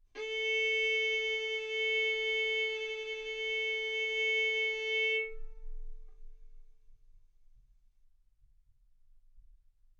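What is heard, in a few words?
Violin arco mf A string A4 B4 stereo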